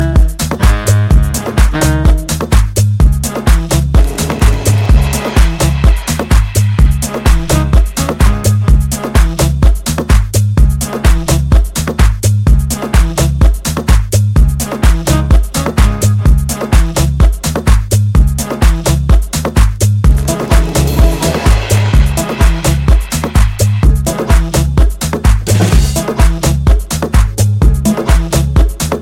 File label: Crazy Beats